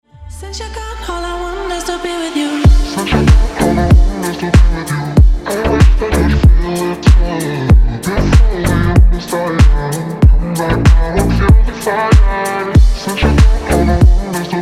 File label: slowed reverb